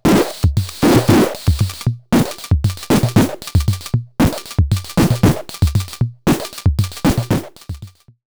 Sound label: Loop